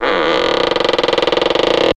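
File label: Heavy Wood Creak, hinges & creaks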